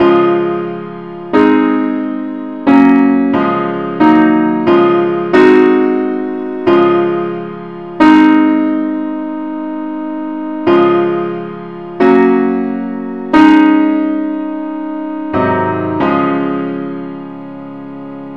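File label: simple Accompaniment key des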